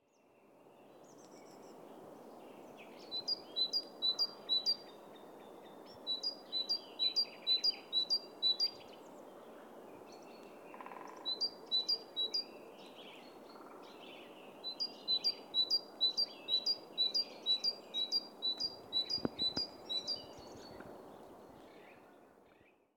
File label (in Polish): glosy ptakow bogatka; avesnature